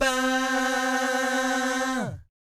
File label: VIB FX, Vocal Planet Jazz & FX; ILIO; JAZZCOMBO